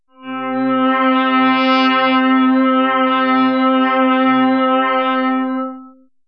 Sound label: brass